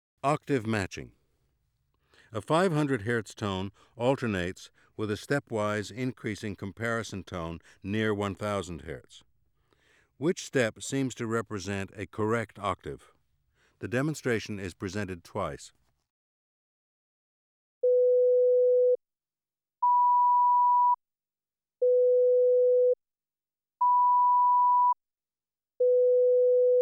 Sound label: Octave matching